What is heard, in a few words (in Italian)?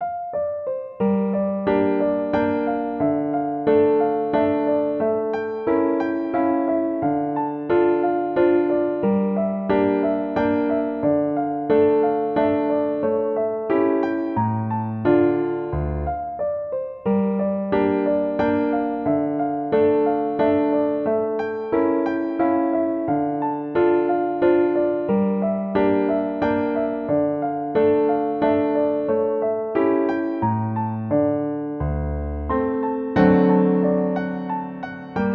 Danza in re piano